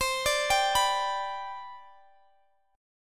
C Major 7th Suspended 2nd, C, down, Guitar, Open D